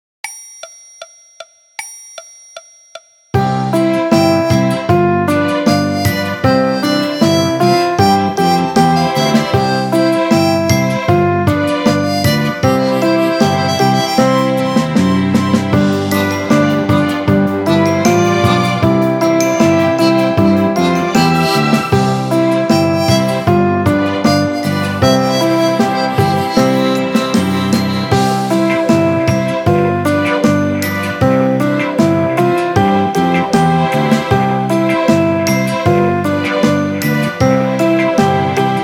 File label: Piano Orch